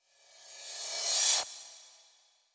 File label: cymbal reverse slow